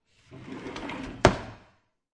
Drawer Close Wooden Kitchen, Household, Drawer Close, Wooden, Kitchen Drawer